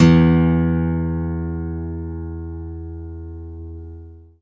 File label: 6 String Steel Guitar E1; six string steel guitar